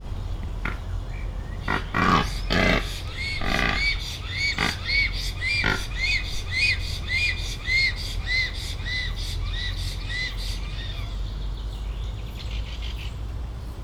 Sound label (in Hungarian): felvillanás, rózsás gödény; allathangok; veszpremizoo 2013